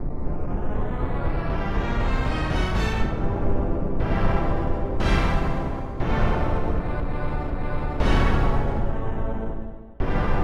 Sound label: Orchestra Hit; FF7